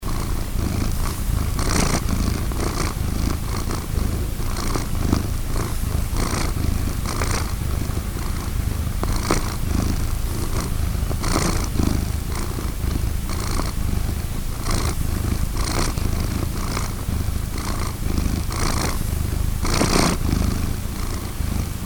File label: Purring Domestic Cat; 16bit 44Hz